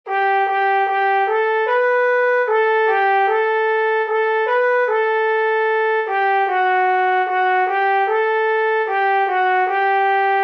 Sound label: melody; psalm 119a א Aleph